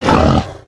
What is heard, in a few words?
boar attack